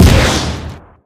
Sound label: ion cannon shot, energy; video games; ambient; source engine; portal2